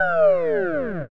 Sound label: mecha down